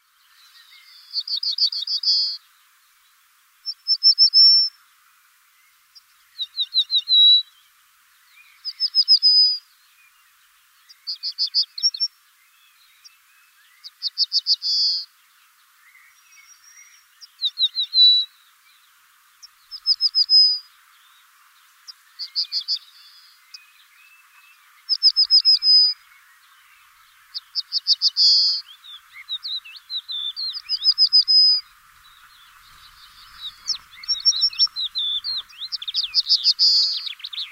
cretzschmars bunting

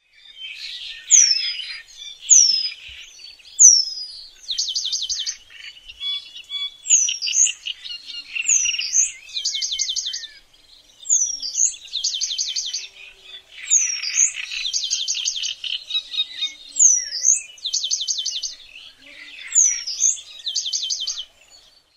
penduline

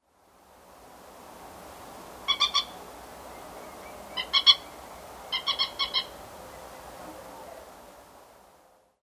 moorhen nocmig

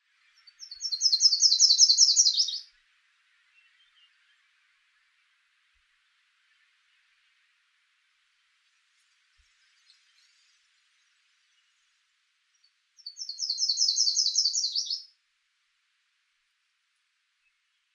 yellow rumped warbler

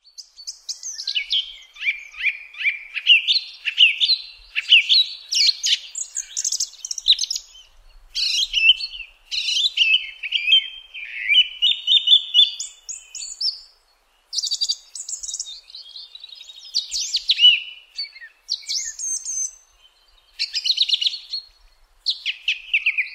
song thrush

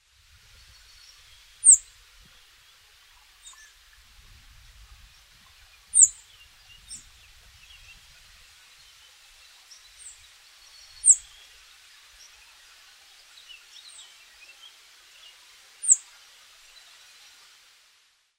rock bunting

